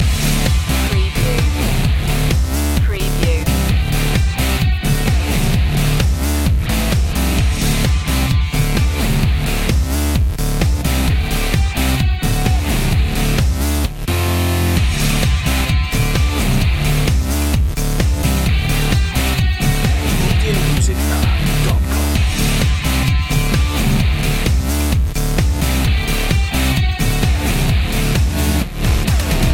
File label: 80s revenge loop